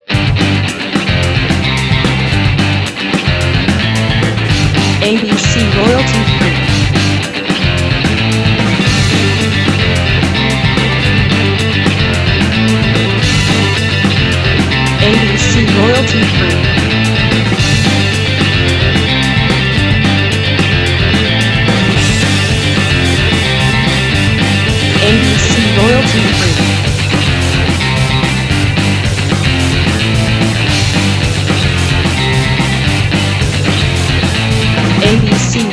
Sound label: indie rock guitar